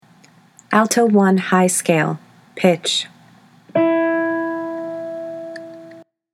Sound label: alto scales high; all-state choir